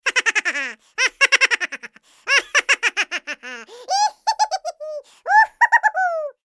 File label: Laughing Cat